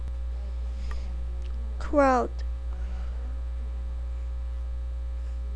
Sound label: crowd